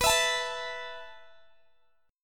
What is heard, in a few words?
B 7th Suspended 4th B down Guitar Open G